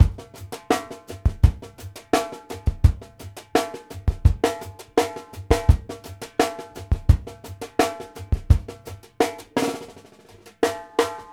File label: FEEL B, drumworks; Sampleheads; New York City Drumworks VOL-1